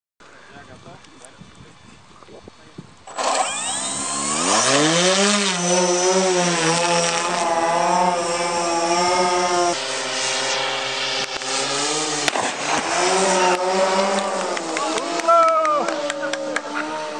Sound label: drone